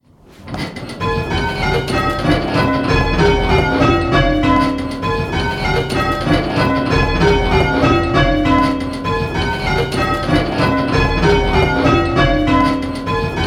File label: Lydian, bells